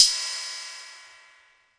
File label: 606 cymbal, drum machine; 606 Basic